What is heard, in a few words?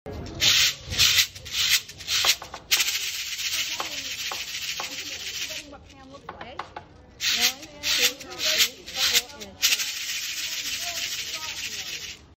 Shekere